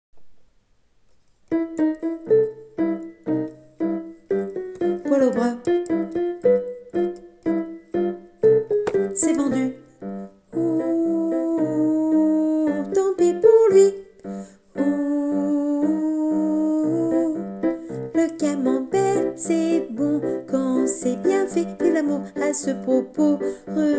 alto

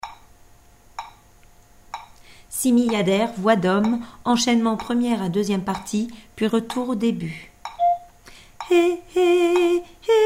hommes

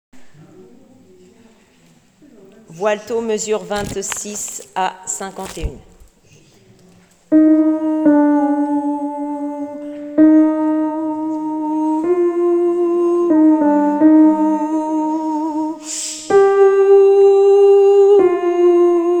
la nuit alto

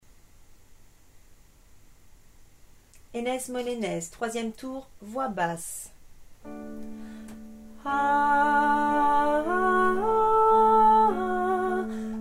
basse

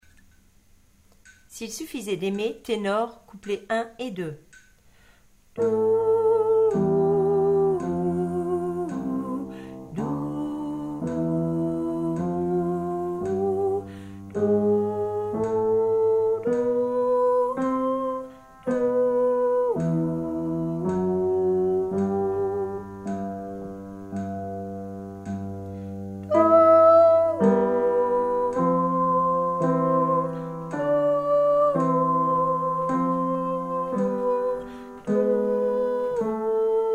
tenor

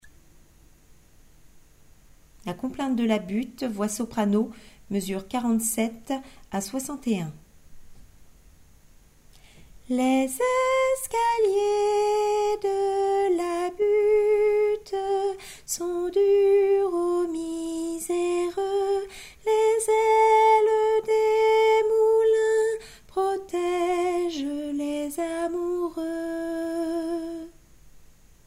la complainte soprano

